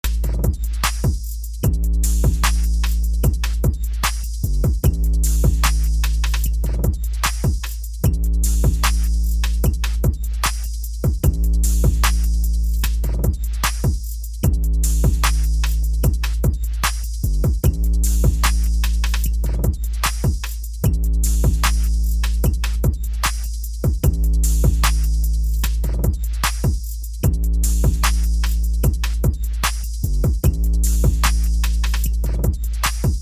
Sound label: Rolling Trap, Musical Beats; smirksmilegrin games